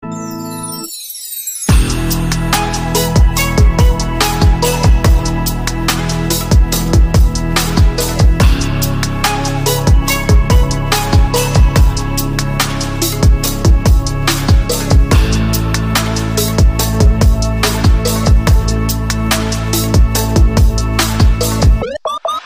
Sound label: Instrumental